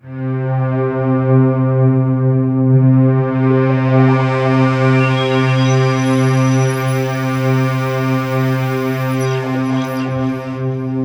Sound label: C3, Synth Line; 90 s Sample CDs; NiceBeats; SynthLine VOL-15